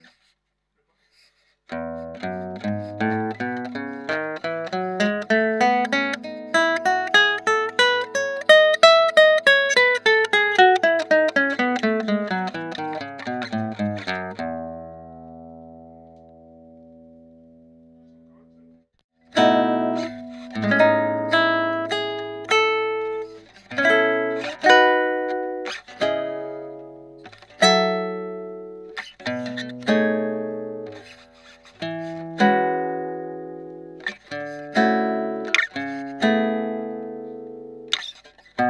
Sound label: Guitar classical RAW PIEZO